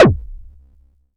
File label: kicks